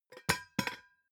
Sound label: pot lid close plop short; objekte; topf